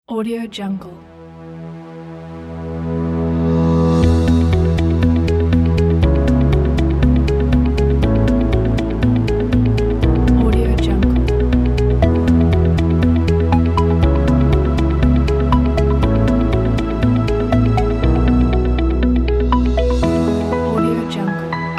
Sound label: corporate motivational logo